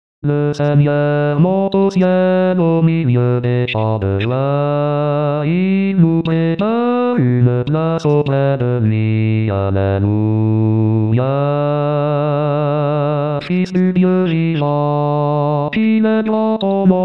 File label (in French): chant pour l'ascension, Chorale; Pentecote